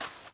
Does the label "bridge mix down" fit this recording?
No